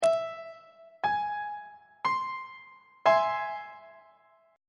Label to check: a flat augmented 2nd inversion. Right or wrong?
Right